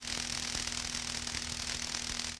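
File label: sparking loop; electrical sparks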